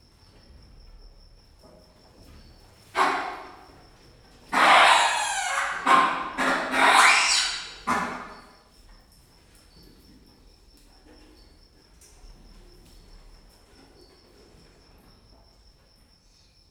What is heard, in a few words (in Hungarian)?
sikitas, galleros pavian; gyori zoo 2014